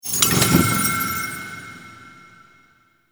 Bridge Collapse; Warframe; Ambience; Cephalon Weave

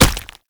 Proto Infested Step; Enemies; Steps; Warframe; Brachiolyst

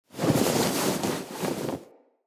Tent Cloth Rustle, Interior; Soul Plane

